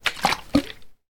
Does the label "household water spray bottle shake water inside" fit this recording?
Yes